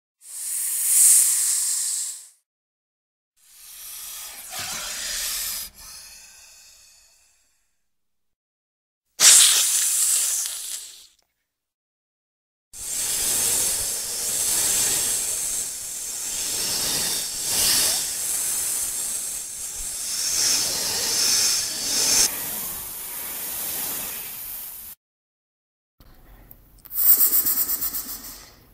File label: Snake Sound Hissing Hiss; boa yilani; Snake Sound Effects